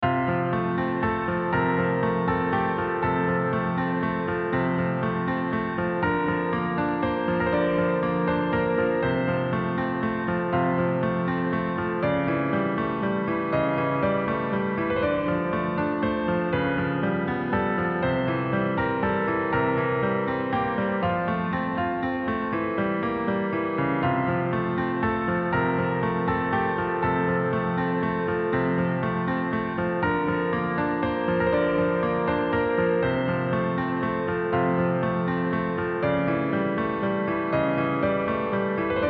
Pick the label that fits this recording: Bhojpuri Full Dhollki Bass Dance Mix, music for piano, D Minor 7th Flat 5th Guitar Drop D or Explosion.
music for piano